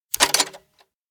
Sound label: lever move; anemoi